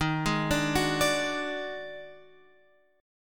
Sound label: Eb-Minor Major 7th Double Flat 5th Guitar Open F, EbmM7bb5 chord; JGuitar